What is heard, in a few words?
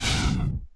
leg sprint; Dystopia; implants